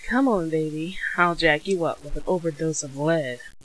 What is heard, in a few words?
overd lead